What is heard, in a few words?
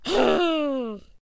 Void Cultist Death Vocals